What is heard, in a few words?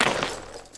male mud, footstep